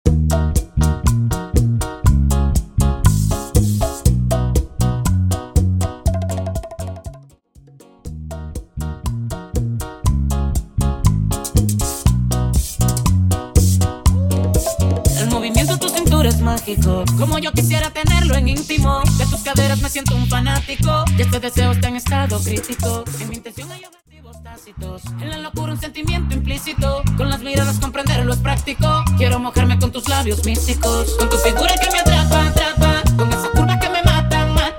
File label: bachata project 120 BPM